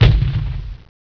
XPLODE

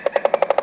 woodpeck; ANIMALS

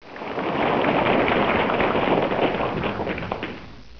APPLAUS

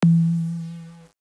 HOLLOW

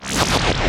LAZER; MAGIX

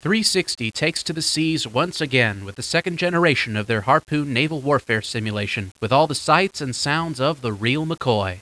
HARP

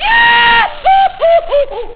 fall; AMIgotchy

